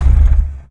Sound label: engine off; tdmcars; gtav; vehicles